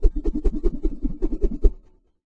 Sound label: kung fu staff, Kung Fu Staff Swings